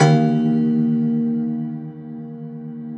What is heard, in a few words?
Rubbery Piano, prepared piano; Total Piano